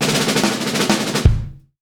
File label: Fills, Monster Beats; Zero-G